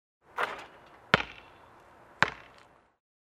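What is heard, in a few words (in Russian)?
баскетбол мяч проходит через сетку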